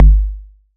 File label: bd tech; hyperfocus dsp